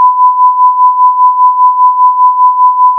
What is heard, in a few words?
sine amp mod